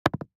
DICE on Neoprene, Throw and Roll, Metal, 1 One Die; Samples; ArticulatedSounds; DICE Sfx Party (Extended)